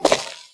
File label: footstep paved surface; creatures; footsteps; mono